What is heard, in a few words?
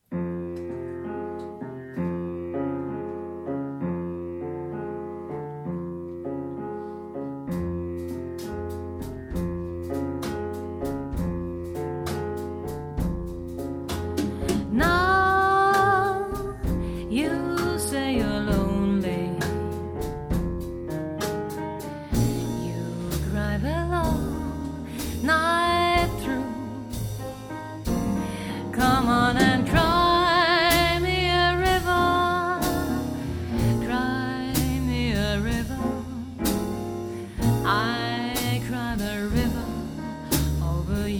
cry